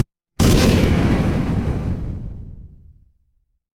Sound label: impact explosion